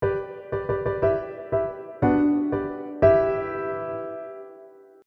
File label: 1 Layer Harmony, a chordal texture or group of instruments that are acting as a single unified unit; Rule of Three